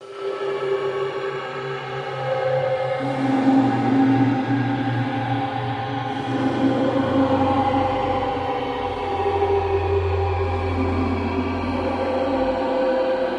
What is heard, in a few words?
Absolute Zero Deep Cave